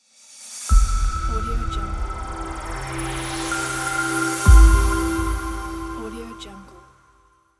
ambient crystal logo

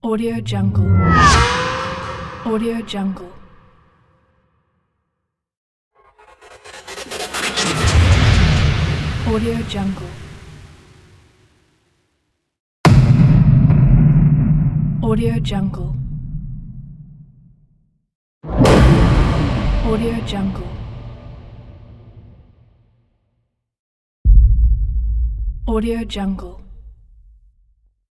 massive boom

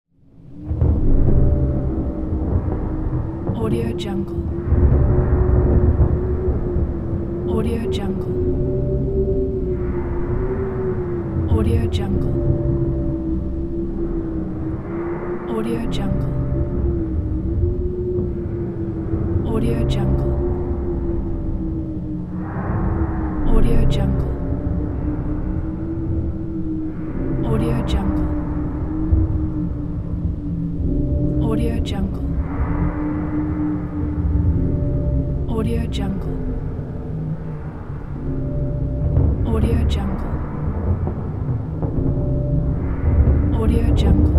dark horror background

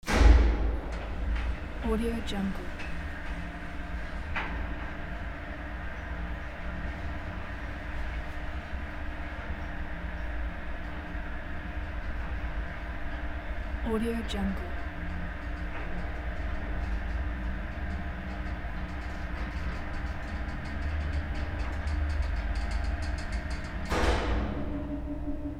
elevator; soundwood

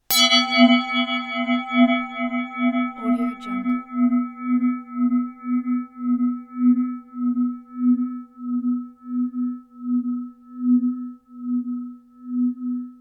bell spinning soft long decay

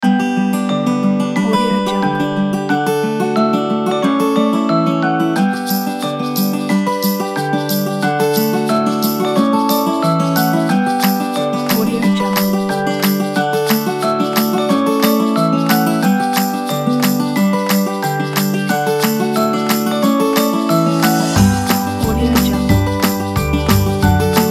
first steps

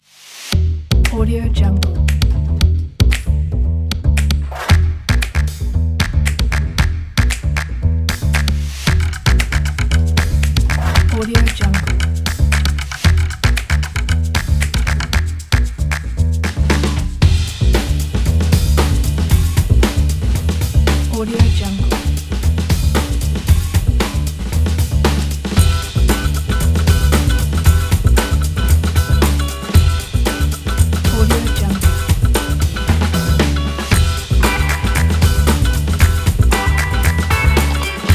the action funk

